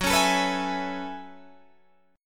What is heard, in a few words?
Gb Minor 11th Gb Guitar DADGAD